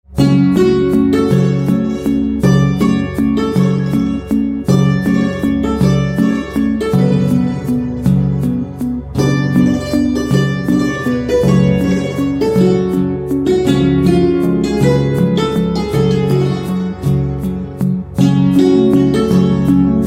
Santoor

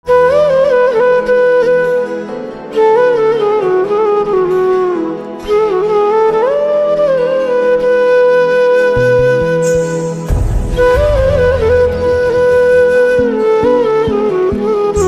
Flute

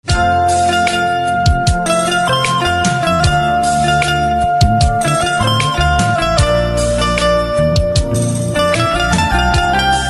Music Banjo; instrumental ringtones

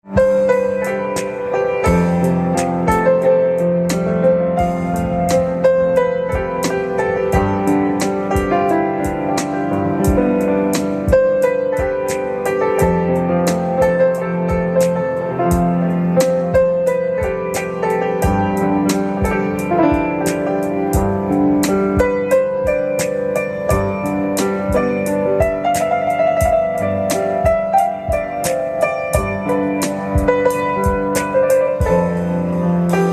Piano

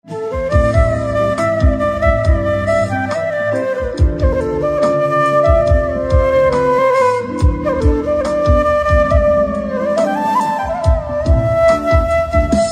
Flute, instrumental ringtones

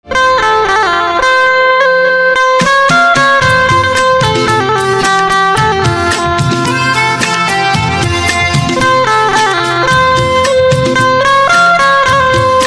Banjo

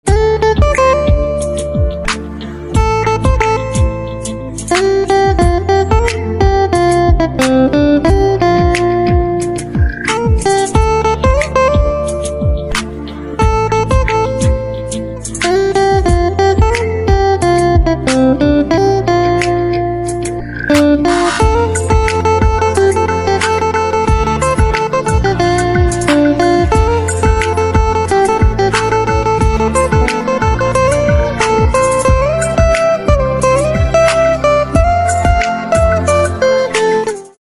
Guitar

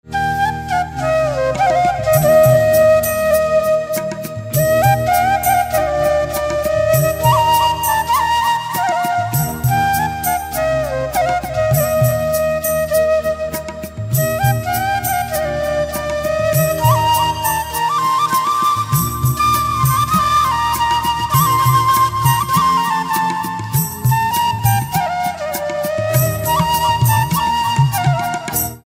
Flute

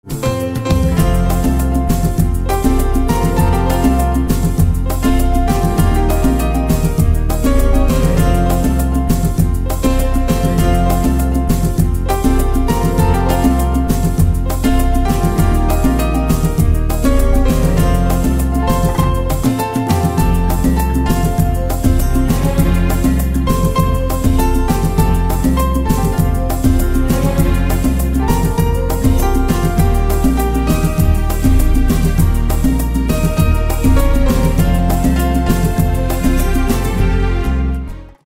Piano

